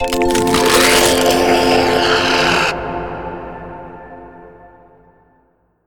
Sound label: Bonus Share Selection Pick Bonus; Jason's Quest; genesis